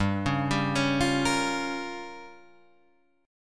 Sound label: G Minor 7th Flat 5th